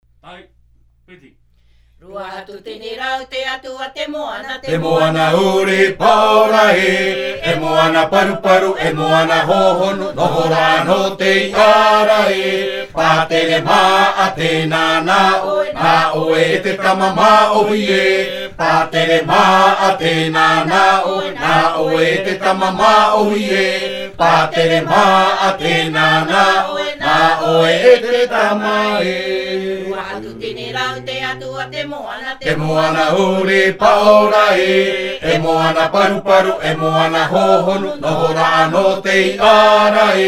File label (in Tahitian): Himene tarava Raromatai